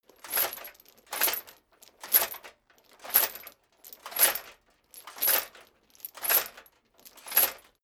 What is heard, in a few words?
metal chain movement on plate sequence